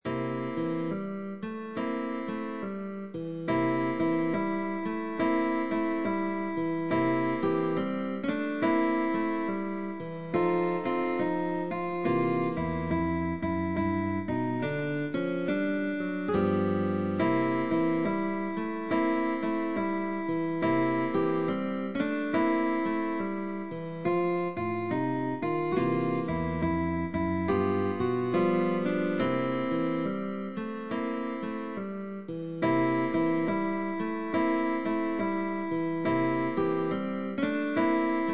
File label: Jingle Blues